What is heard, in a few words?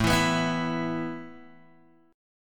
A Suspended 2nd A Guitar Open G